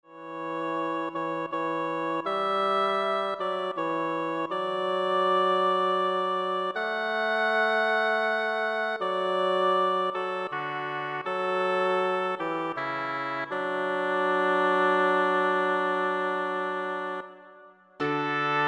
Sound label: Oboe Bassoon